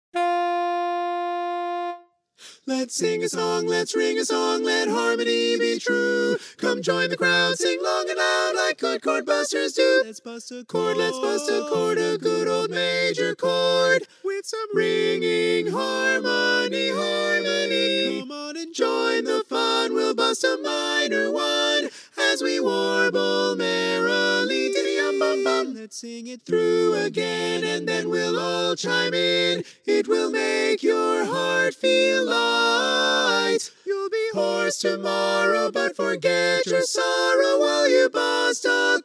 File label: ssaa tenor